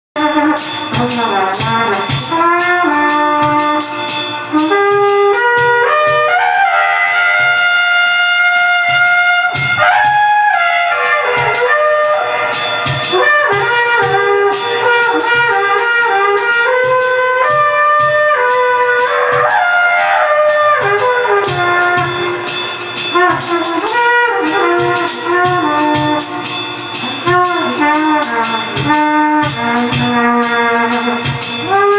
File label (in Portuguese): trompete